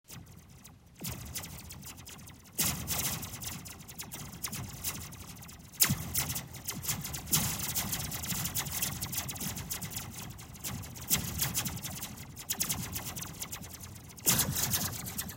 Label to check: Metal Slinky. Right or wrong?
Right